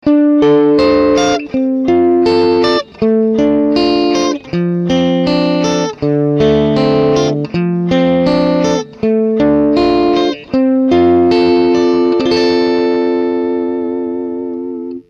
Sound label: funk